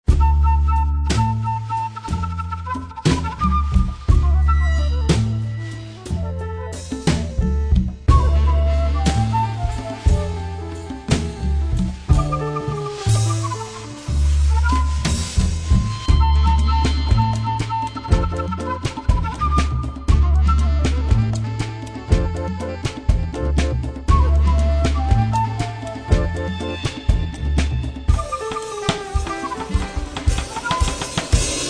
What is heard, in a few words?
Jazz World